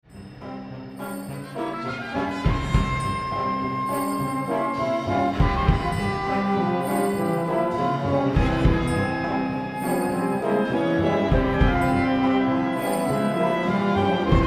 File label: Fantasy for Wind Ensemble